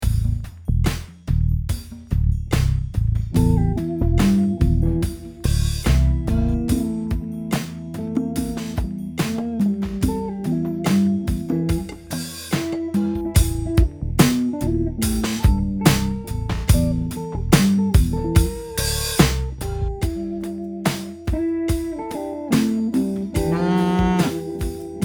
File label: slo funk